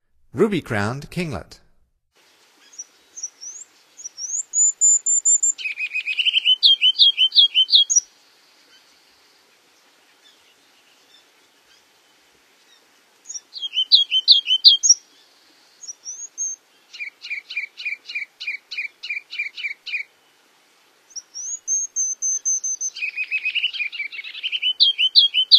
Ruby-crowned Kinglet, Songs of Birds of North America; Stokes